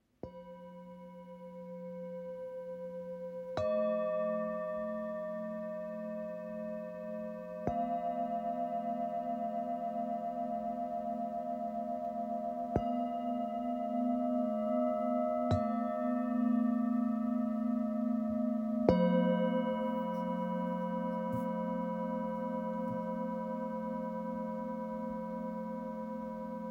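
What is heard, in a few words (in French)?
Set precision, bol chantant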